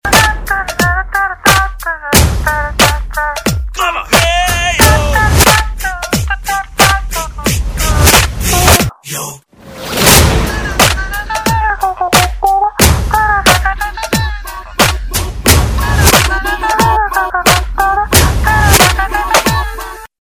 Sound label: big b tone